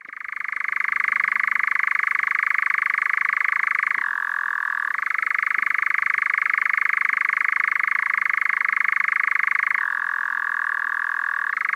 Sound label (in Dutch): nachtzwaluw engoulement; ENGOULEVENT EUROPE; ANIMAUX